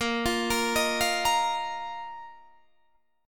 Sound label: Bb Suspended 4th, Bb, down, Guitar, Open D